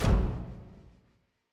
High Action Stop 100bpm; project zomboid

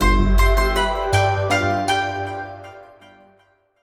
Start; dawn of china rise of qin windows gamerip 2018

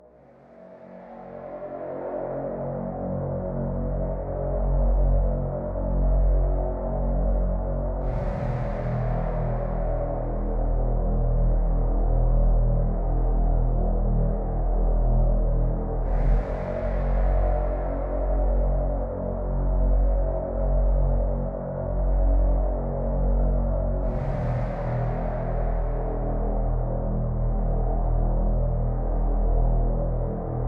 Space ms-20

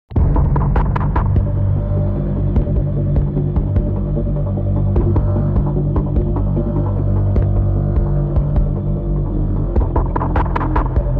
Final Battle Loop; Infinity Blade 3

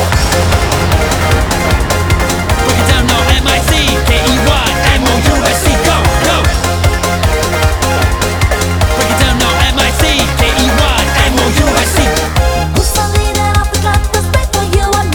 March (Eurobeat Version); Non-Stop Megamix

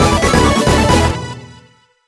stage complete; 88 Heroes 98 Heroes Edition

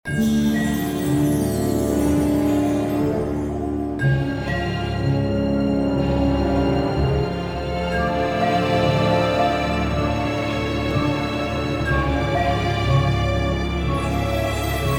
Catacombs Bg Scared

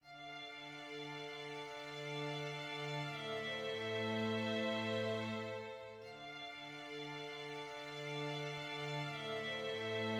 sad med

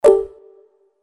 Percussive decrease; WeMod

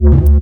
Boss Attack

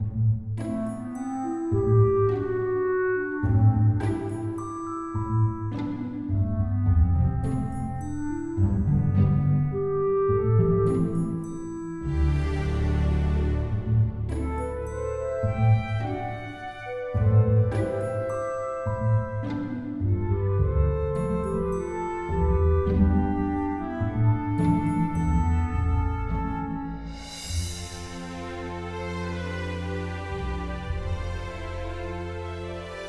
Campsite - Harmonic; Antagonist

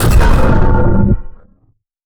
Shotgun Fire; Cruelty Squad

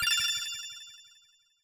Crystal spawn; Super Mombo Quest

